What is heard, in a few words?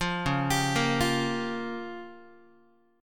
Db 6th Flat 5th Db down Guitar; Db6b5 arpeggiated; JGuitar